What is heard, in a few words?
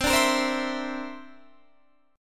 D 7th Suspended 2nd Sharp 5th C